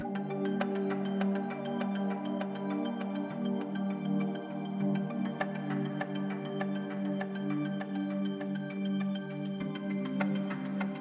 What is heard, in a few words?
Future Ambience; Landinar Into the Void